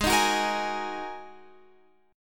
G sharp 7th Suspended 2nd G sharp Guitar Open F; G#7sus2; strummed

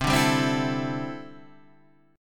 D 7th Suspended 2nd Suspended 4th C

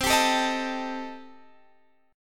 C Minor 6th C Guitar Standard; Cm6 strummed; JGuitar

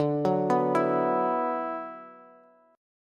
D 6th Add 9th, Bass; arpeggiated; JGuitar

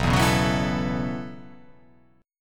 B 9th Suspended 4th B down

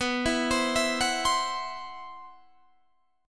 C-Major Sharp 11th B, guitar

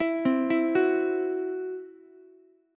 C Major Flat 5th, Ukulele; arpeggiated

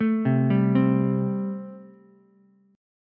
A-Minor 7th A Ukulele; Am7 arpeggiated; JGuitar

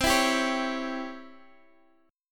C sharp Minor Major 7th Flat 5th C, guitar chord

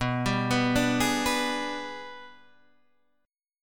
Ab Minor Major 7th Flat 5th

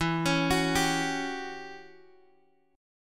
E Minor 6th Add 9th E down Guitar Open F; arpeggiated; Em6add9

